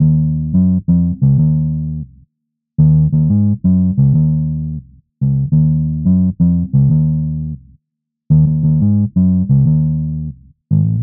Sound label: Bpm87 Eb Italian Chase Bass